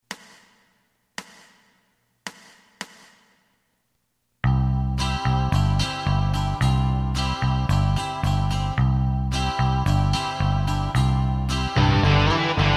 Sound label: drum